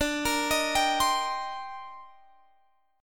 C Minor 7th D; Cm7/D; arpeggiated

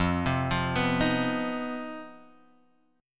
C Minor Major 7th Double Flat 5th F, chord

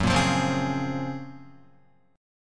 F sharp Major Sharp 11th F sharp, Guitar; strummed; JGuitar

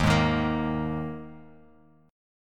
D sharp Suspended 4th D sharp, Bass Standard; strummed; JGuitar

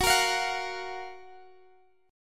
F sharp Major 7th, Guitar, Open G

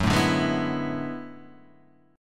B Minor 13th F sharp

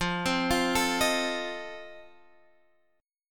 F 7th F down; arpeggiated

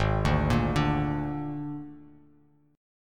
G sharp Suspended 2nd Flat 5th, Bass Standard; G#sus2b5; arpeggiated; JGuitar

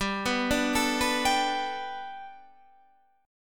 G Major Add 9th, Guitar, Standard; arpeggiated

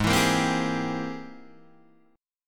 Ab Augmented Major 9th Ab Guitar Open D

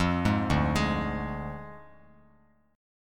D Diminished D, Bass Standard; arpeggiated

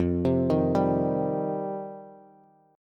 F 7th Suspended 2nd, Bass; F7sus2; arpeggiated; JGuitar

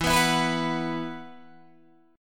E Suspended 2nd E, Guitar, DADGAD; 14,14,14,16,14,16

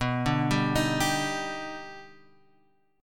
D sharp Augmented Major 7th B

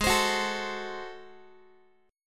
G sharp 7th Flat 9th G sharp down Guitar, chord; G#7b9; strummed; JGuitar

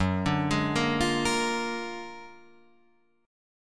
Gb Augmented Major 9th, down, Guitar, Open D

